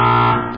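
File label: loud horn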